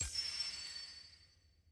ui button continue click; tft

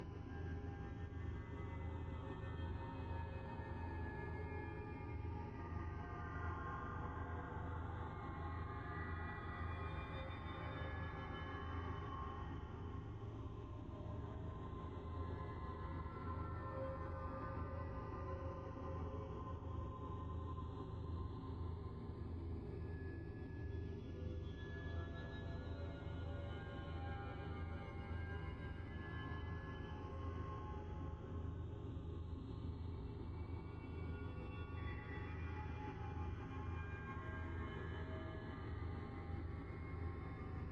amb

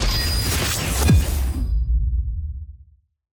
ready check outro accepted

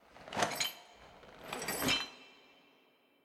walk handstand loop

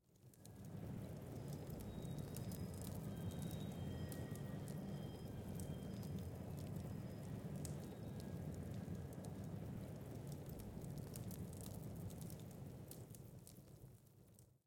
hover; eternals

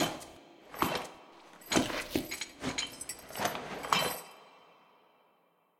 walk handstand enter phase

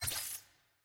ui gameplay skill ready

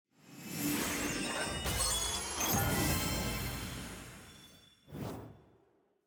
rank up

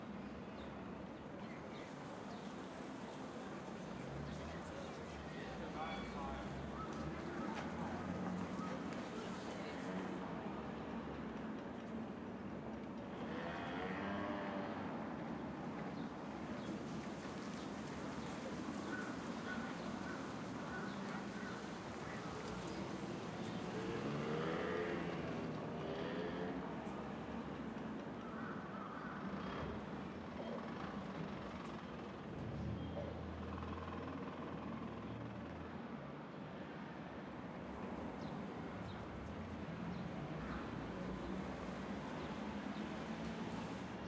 amb

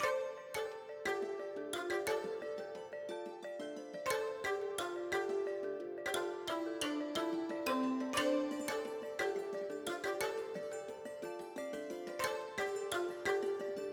mood loop whimsical; lol

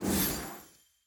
trans splash; champ select

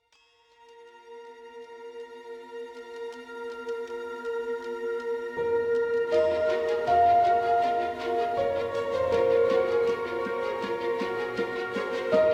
music in queue loop; teamfighttactics set16

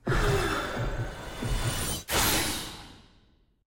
skill attack; skill 1; evelynn

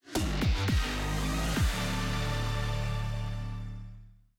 victory

